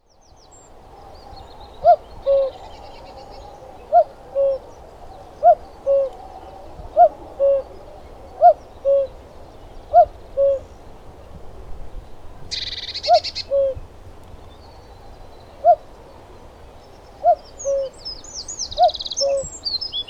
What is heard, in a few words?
Common Cuckoo Cuculus canorus